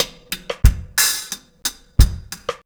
RG90 DRUM, REGGAE

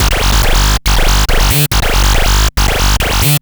Analoop, Industrial Analog; Analoops; Sonic Foundry (Sony Creative Software)

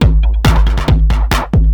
percussion loops, Techno; Techno ID

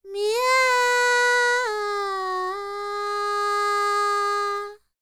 World Voices, INDIA; ILIO; Vocal Planet

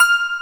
YANG-CH'IN; AKAI S6000 CD-ROM - Volume 1

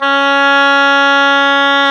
OBOE, Orchestral Winds, Oboe mf f

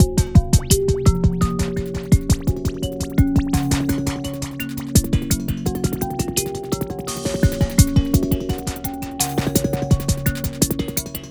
SILICONE MARIMBA, ARPEGGIATOR DRUM MACHINE; Sonic Foundry (Sony Creative Software); Vir Unis Infusion

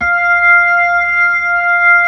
B-3, Brite; Keyboards of The 60's and 70's

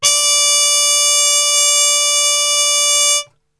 D 3, Brass; Best Service; Brass Super Section; TRP HARMUTE; 90 s Sample CDs

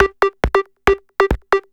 percussion loops, Techno; PS-45

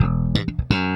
Slap, Bass; Bass X

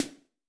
Snare, Old Skool House, 132bpm; Big Fish Audio; Marshmellow; Construction Kits

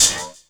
PERC, 170 BPM; Ueberschall; Drum & Bass Resonance

